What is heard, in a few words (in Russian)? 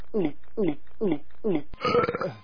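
сглатывание питье воды и отрыжка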